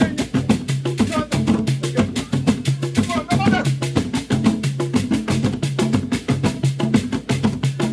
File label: 121 BPM; Global Influences Loops